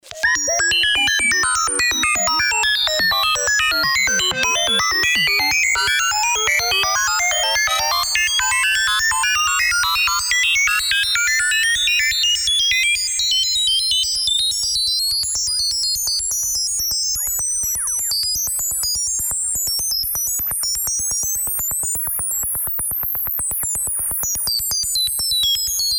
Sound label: Mini Brute V SssH Aliasing